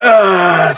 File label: male orgasm; ooh ahh